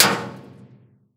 bullet metal barrel

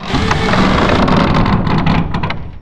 dog straining; Half Life 2

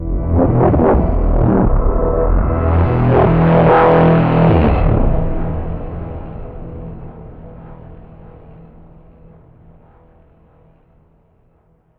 approach warp; Half Life Alyx; music; vault

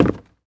wood, player footsteps

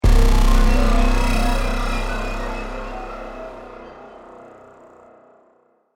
deathcam, music

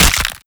fall, damage; player, damage

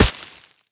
grass impact bullet; physics; surfaces

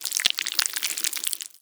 flap long, zombie blind jaws; Half Life Alyx; creatures

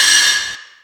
grind, manhack; Half Life 2

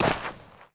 sand, player footsteps

